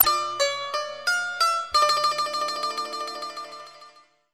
Gitar Tone

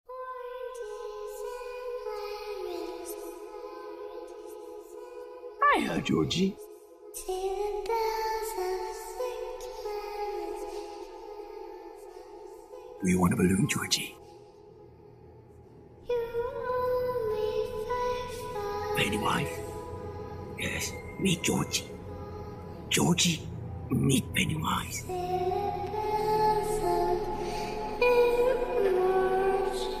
creepy ringtone

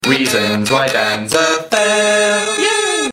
Yay

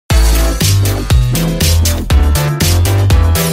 Electro Swing

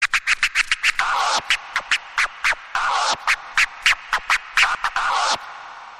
B-boy Scratch

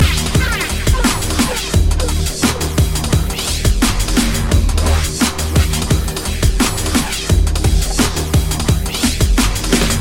Iphone Cyber Ring Tone

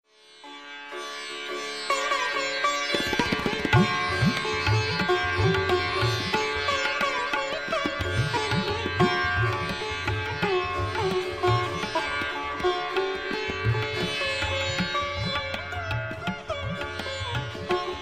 Sitar

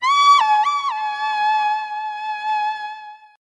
Hawk Grass; Twilight

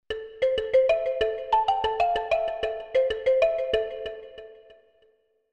Nice Msg Tone

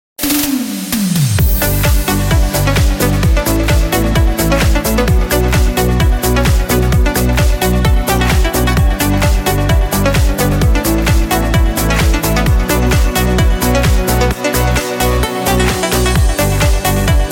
House Love Music

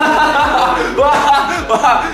laugh

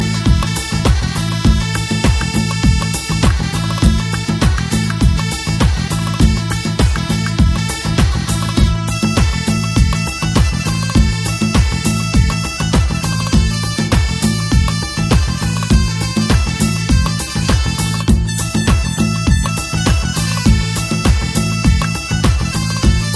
Ring Tone; 143 Movie

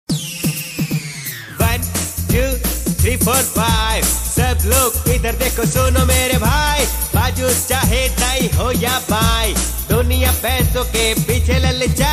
Funny Tone

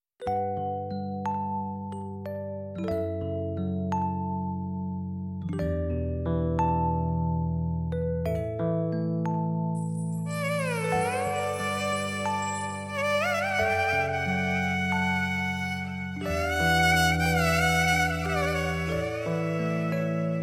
Soft Music

